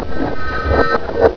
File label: lever